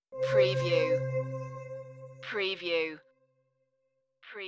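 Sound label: Suspense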